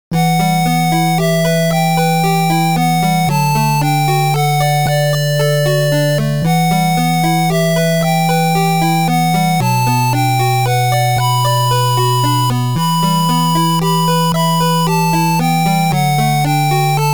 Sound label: 8bit